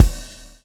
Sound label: swing